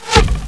arrow; Odyssey

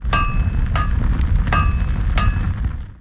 foundry, Ambient; Zeus

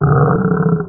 roar; parasite

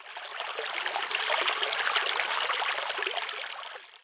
irrigation; PHARAOH

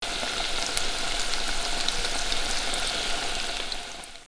Fat Fry; Virtual Graceland

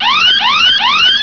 HuiHuiHui; Burning Rubber 1.2.3

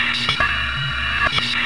mtl bong; Total Chaos Nukem 3d Pack; duke

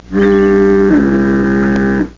Foghorn

